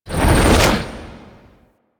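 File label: Ghost Teleport Out; Warframe; Priest Quest; Rell Ghost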